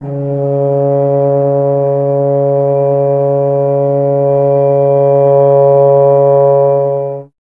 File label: BRS TUBA, Solo Instruments